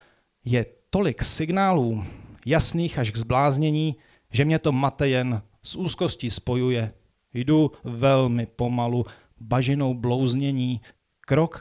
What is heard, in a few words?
didgeridoo